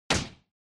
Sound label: Motion Grab Dry